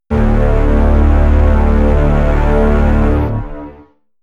synth trailer hit, hits impacts; whooshes rises

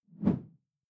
whoosh simple short low; swooshes-swishes

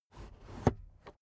car headrest full adjustment hard slow

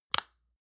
lotion large lid close medium; berufe; friseur

